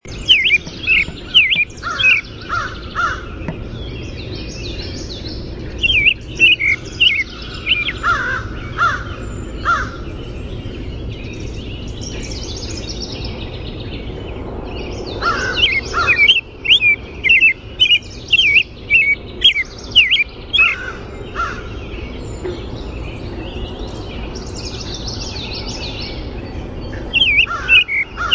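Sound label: American Robin song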